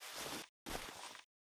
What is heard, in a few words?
torch use; STALKER; IWP; item usage